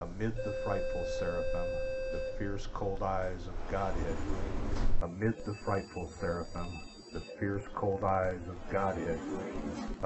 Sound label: DUET